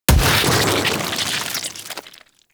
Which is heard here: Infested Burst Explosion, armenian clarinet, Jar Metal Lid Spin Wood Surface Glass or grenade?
Infested Burst Explosion